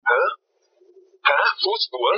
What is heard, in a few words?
footsteps